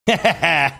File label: short laugh